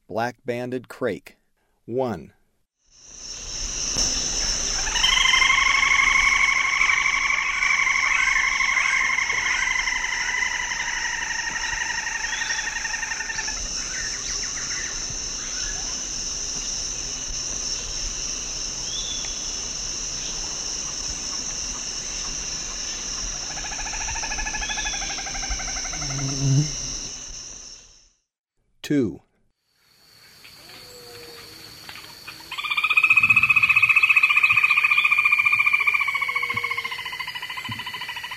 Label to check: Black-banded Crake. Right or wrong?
Right